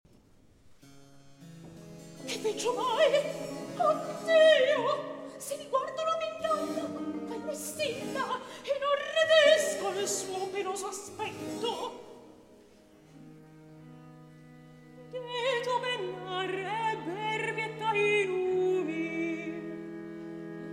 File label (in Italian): Recitativo; Che Veggio Mai, o Dio; Polifemo